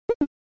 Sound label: Arcade Action Footsteps